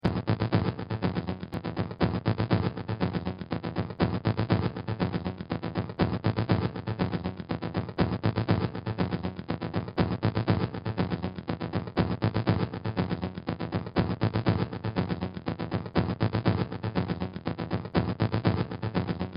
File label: music; DelphiArcadeGames; SpaceRocksStarter